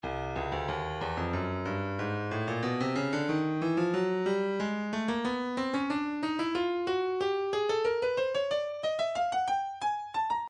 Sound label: breakbeat 092 bpm nustep; Stylus Breakbeat Midi Files